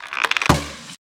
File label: bowstring; Fate